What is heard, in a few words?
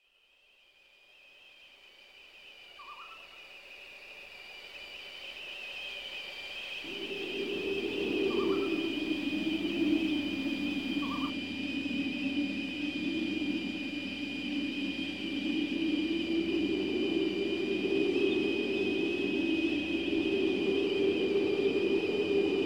soundscape